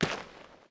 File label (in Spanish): Paso tierra